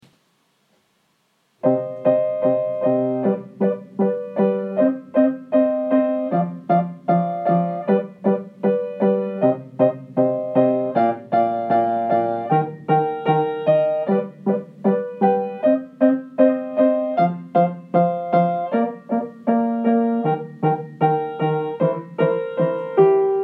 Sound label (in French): choeur du froid piano